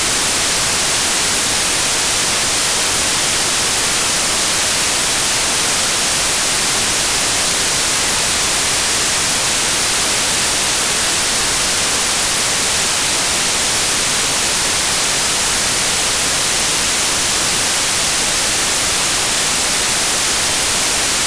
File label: 20 seconds of white noise